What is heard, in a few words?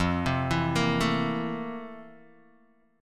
F Major 11th Guitar DADGAD